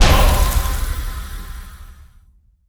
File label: scatter Hit; netent; bloodsuckers2